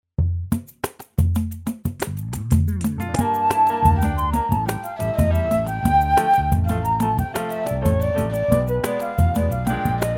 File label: play flute reference